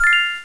Diling

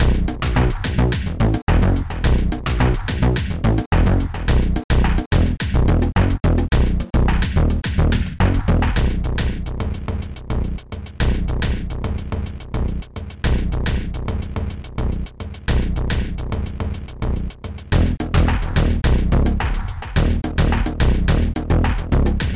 step loop example

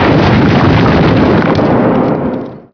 debris explode; PC Gamer IT CD 41; Daikatana

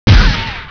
kick; CHASM

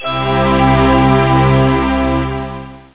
Spirit bell MAJ

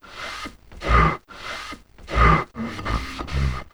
clean Bathtub; Singles; French Version

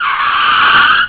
car br

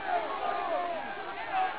UPRISE; Caesar™ II Demo

